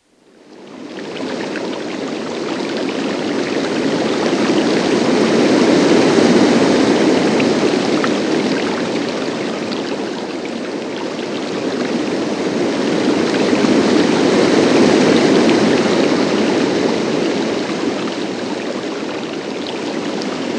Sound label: Calm Ocean Waves; Oscillating